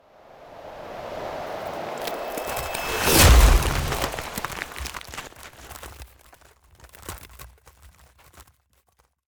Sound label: Ice Spell Damage Direct Icy Slash; Arctic Aura